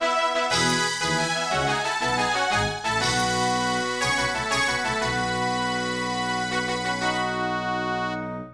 ファンファーレ; rpg tsukuru 4 ps1 2000

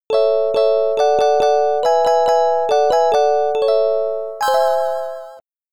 レベルアップ; Kigen Kagayaki no Hasha; PC-9801

